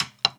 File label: perc spill, Drums, One Shots; Slate; AURORA Sample Pack